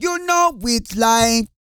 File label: REGGAE, Vocal Planet World Voices